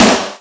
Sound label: OUCH SNARE; Greytsounds; Scott Peers Sound Engineering